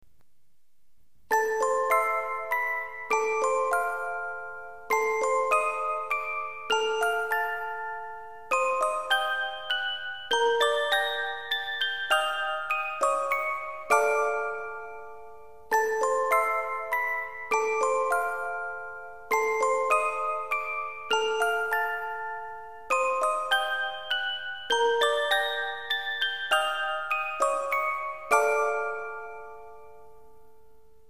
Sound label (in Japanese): 呪いのオルゴール, BGM素材